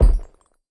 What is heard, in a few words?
Movement